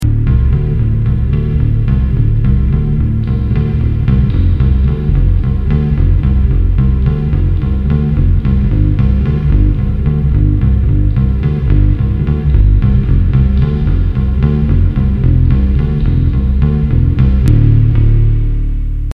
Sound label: Bass Ditty